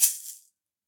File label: SHAKER; Ableton; Factory Packs; Drum Booth